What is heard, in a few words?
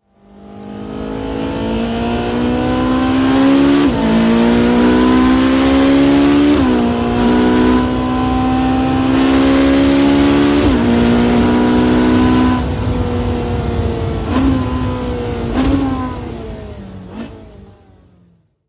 458 Speciale Performance Driving On Board Sound